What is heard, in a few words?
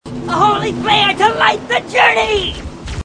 grunt; h3dialogue; grunts